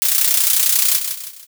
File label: shuffle